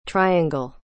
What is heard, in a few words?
triangle